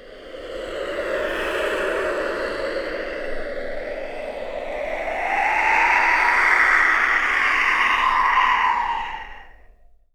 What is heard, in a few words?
AIR WHISTLE; Voice Crystal - Steve Reid Definitive Percussion